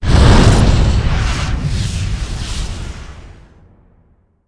fire; doom3; archvile

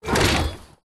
multiturrets lockoff; gow

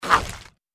gecko chomp; npc

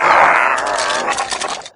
die, revanent; doom3

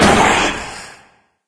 move stop; doom3; sabaoth

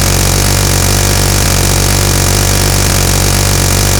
minigun fire loop; weapons

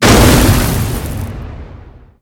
impact ground mid

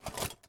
armor

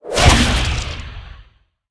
worm whoosh

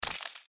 mantis foot; npc

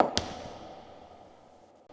step; succubus

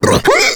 female boomer disrupt vomit

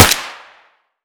silenced

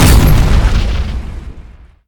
heavy step; gundyr

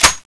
clip in; tfa cso

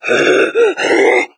chatter combat, hazmat zombie; doom3

